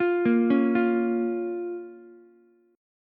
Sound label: Bb Minor, Ukulele; arpeggiated; chord shape; 10,10,9,8